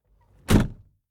Auto Close Door Interior

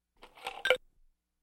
Ice Cubes In A Glass

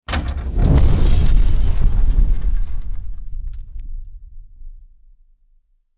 Explosion

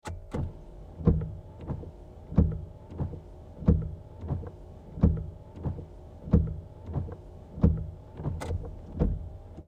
Auto Wipers

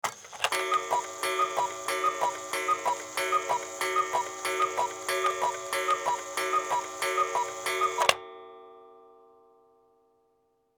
Clock Cuckoo

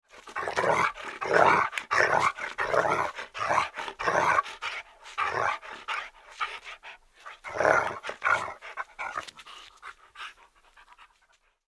Dog Growl Large

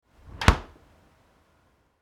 Refrigerator Door

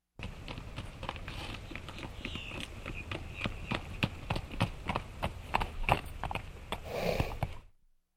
Horse Trots Up And Snorts Outside

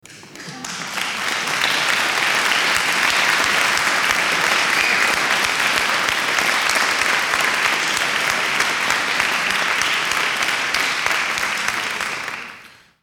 Crowd Applause

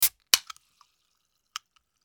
Can Open

